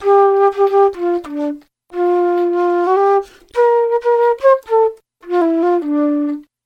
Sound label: flute, Loops Phonky